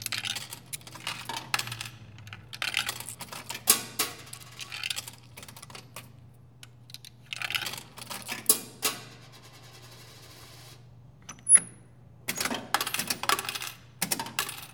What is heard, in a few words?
Vending Machine